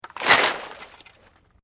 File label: Metal Light Movement Minor